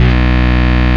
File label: Root B, Ultimate Bass; Vengeance Samples